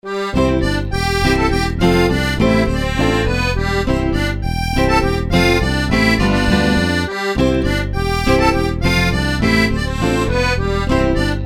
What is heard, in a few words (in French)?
Bourree